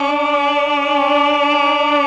CHOR, Propeller Island Legendary M400; ILIO; CHOR+STRING1

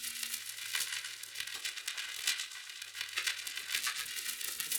Cracklature, sparse soundscape

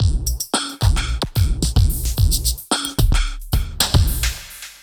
120, beat; AKAI S6000 CD-ROM - Volume 1

